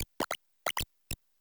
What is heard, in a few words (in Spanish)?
Grito de Maushold familia de tres